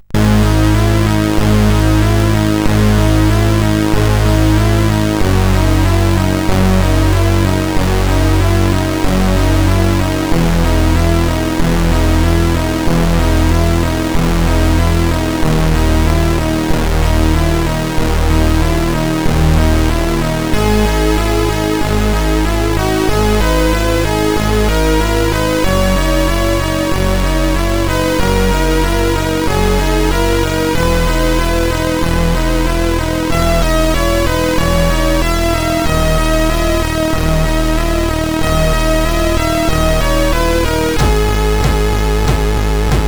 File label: Slow n Cool, CSG8580R5; hvsc